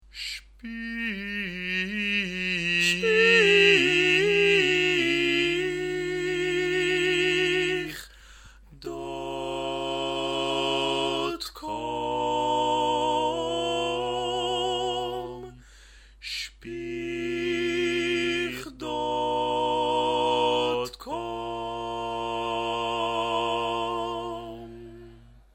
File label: Opera Duet